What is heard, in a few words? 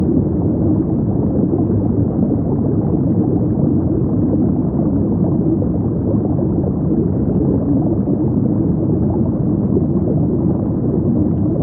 Brown Noise, Underwater River Flowing